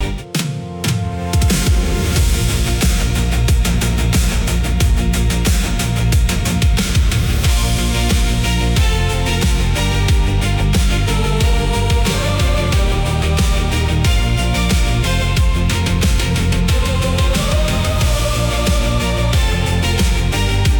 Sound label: loop